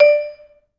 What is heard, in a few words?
VB MUTE D4; Best Service; Peter Siedlaczek's Advanced Orchestra